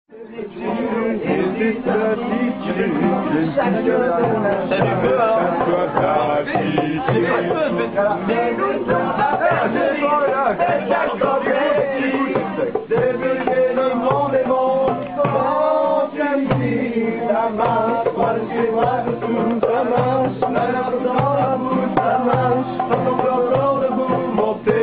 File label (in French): chanson; Marseille